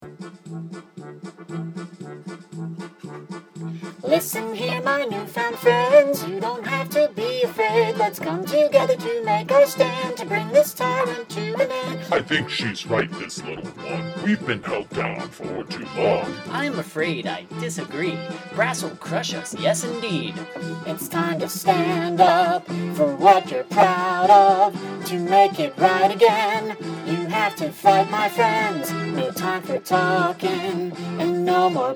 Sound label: Brass Walkin